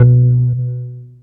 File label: Harp